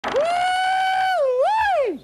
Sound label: whoo wee